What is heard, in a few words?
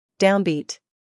downbeat